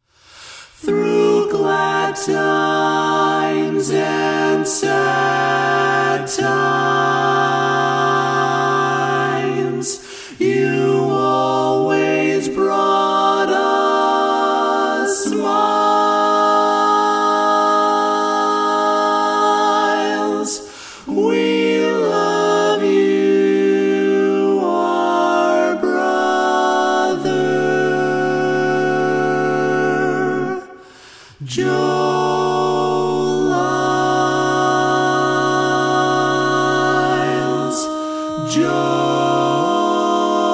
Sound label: TTBB TENOR LEFT; Tags